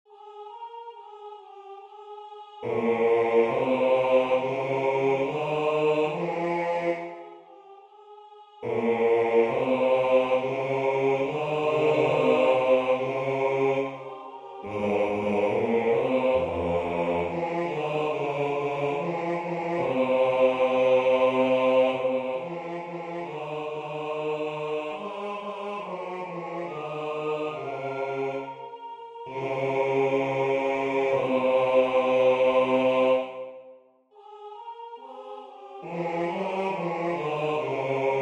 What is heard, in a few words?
Bass, chorale; Ave Maria; Aymé Kunc